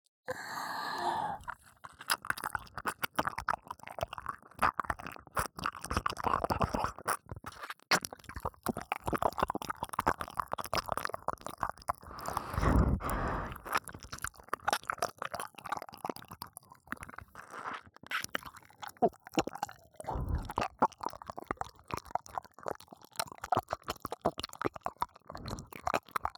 右耳舐め, ASMR

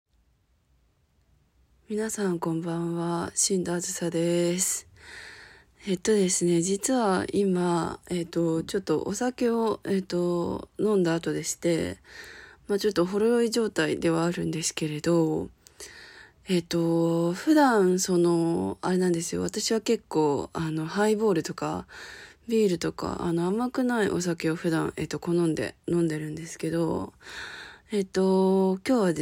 低音ボイスOLがほろ酔いバイブとディルドでアヘ顔オホ声絶頂オナニー; THE FIRST SEXY

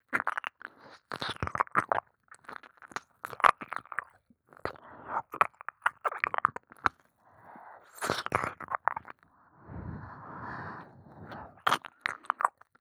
耳舐め

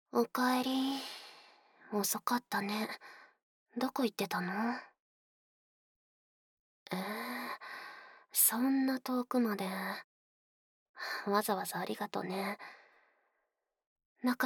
吐息たっぷり両耳舐め, ASMR; メス臭い布団の中で; 手コキ

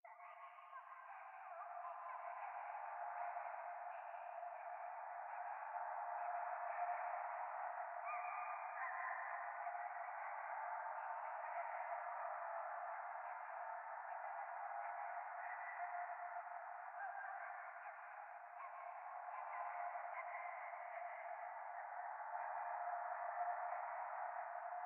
ゴースト 可愛い喘ぎ 車の中

